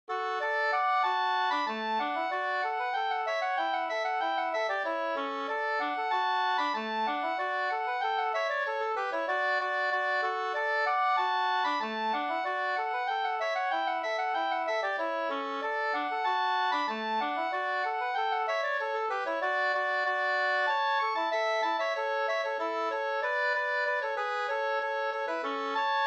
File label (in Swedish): folkdans Hamburg Sextur